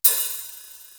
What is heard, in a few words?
HIHAT OP, DRUMS; East West; Global Sounds